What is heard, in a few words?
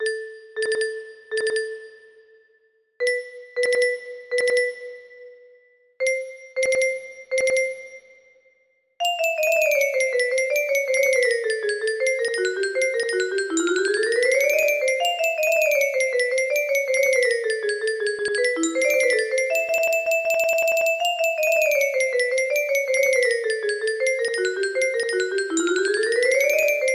trumpet in bb